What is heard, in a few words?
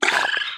wriggler warcry, enemies; Factorio